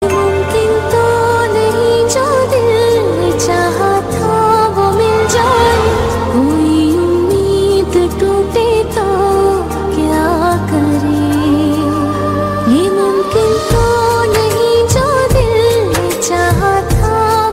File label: sad ringtone